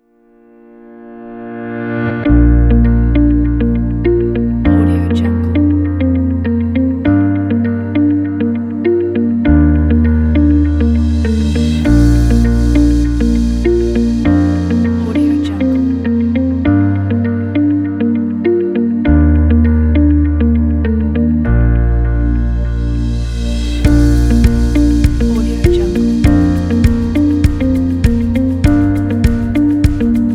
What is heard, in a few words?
positive upbeat